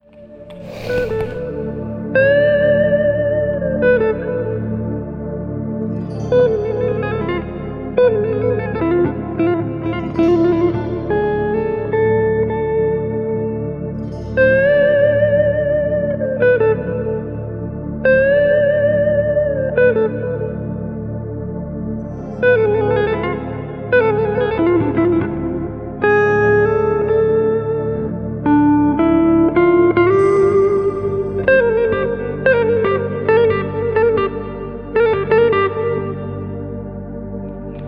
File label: Relaxing Guitar Dreams; largo; instrumentale Gitarren und Panfloetenmusik